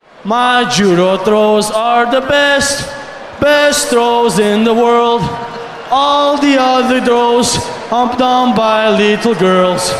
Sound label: Judo Throws; Karos